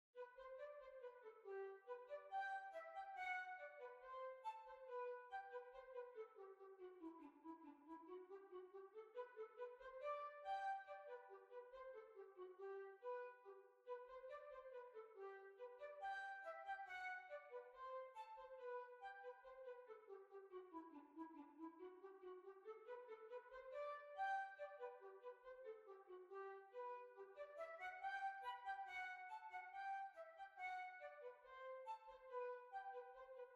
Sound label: Hornpipe; London Hornpipe